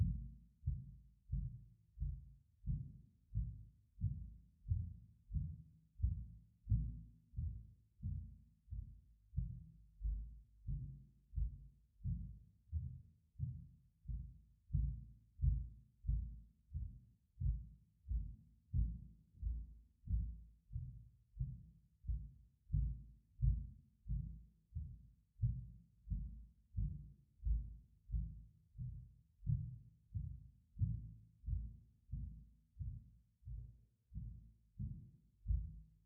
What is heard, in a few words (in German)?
Trittschall, Schallschutz